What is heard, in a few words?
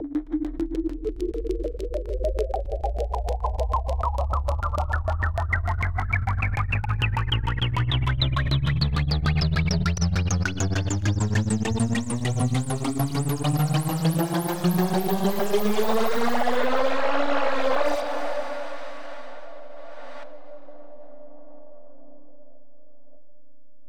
Club Music Risers Fluctation Drops